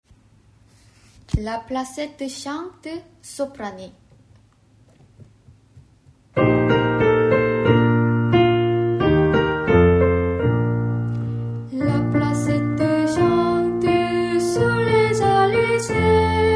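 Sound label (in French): la placette chante soprano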